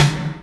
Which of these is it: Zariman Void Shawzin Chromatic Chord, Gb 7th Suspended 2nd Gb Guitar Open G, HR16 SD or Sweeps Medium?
HR16 SD